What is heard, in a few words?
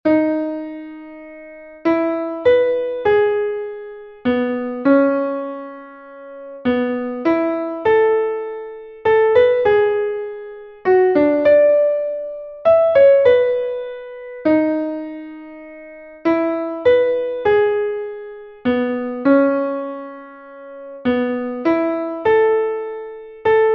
slow jazz